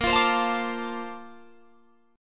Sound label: A sharp Major 9th, Guitar Drop D